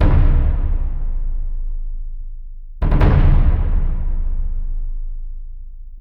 Bassdrum; Сэмплы ударных для фильмов: Bass Drum A; Movie Score; 80BPM; Drums; film music; Барабаны; Музыка для фильмов

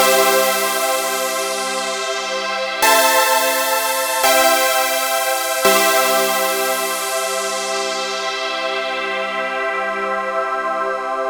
Fresh Lead Pad; пэды

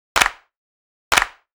Add Clap, хлопок

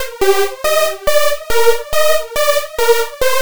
Oktaver

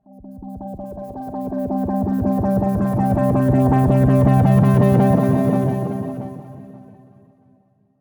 Sepia, electro dub

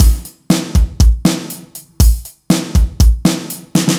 Latin-like A, rock

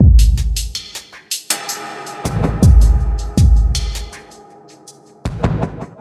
Deep Punch; Ударные и барабаны (Эмбиент); Drums; loop; Deep Punch A; Ambient; 80BPM; ambient vol. 3

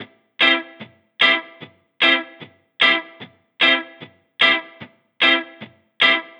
Chorused, reggae регги

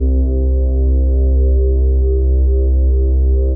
Blue Scape, pad sample; пэд сэмпл в стиле техно

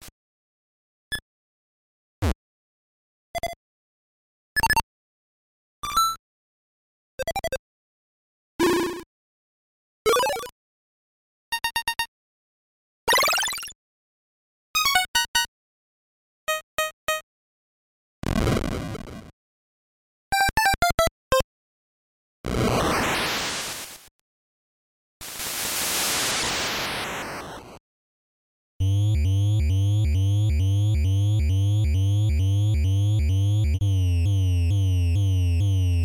sound effects; dr mario

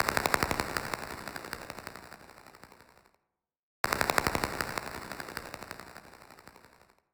Otter FX A, break beat

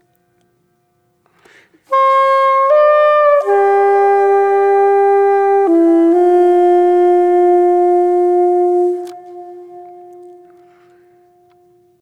Melancholic, brass ambient melancholic; сэмпл

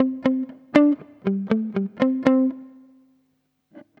Singlenote, jazz

